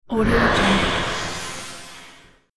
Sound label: whoosh science fiction